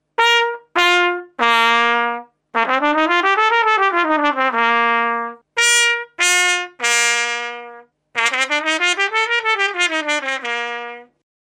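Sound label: trumpet straight copper; faxx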